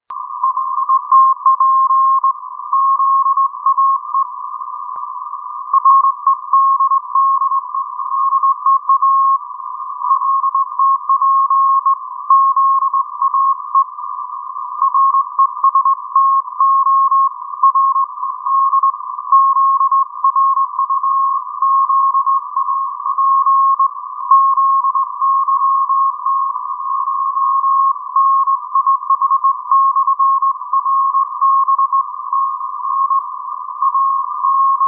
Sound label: CW 5416kHz; LTA